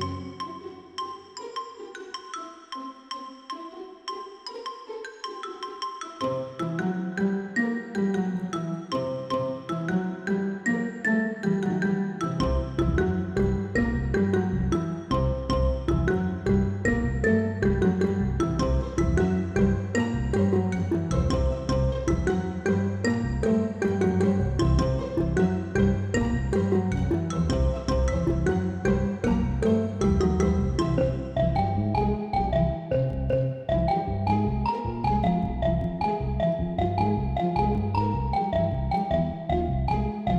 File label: Ruin island